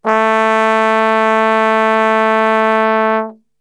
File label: Brass Super Section; Best Service; TRMBSTRGHT; 90 s Sample CDs